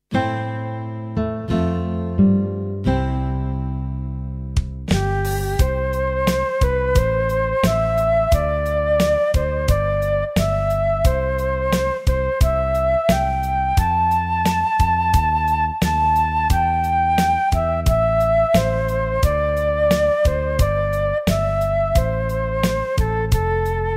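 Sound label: Auld Lang Syne, gitaar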